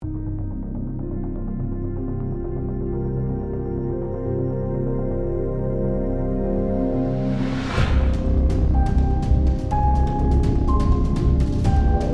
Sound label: Trailer Music